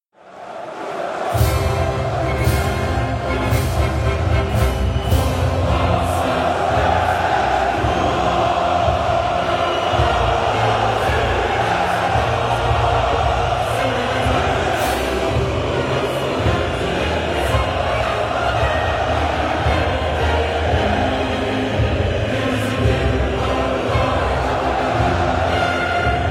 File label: Turkish National Anthem; EURO 2016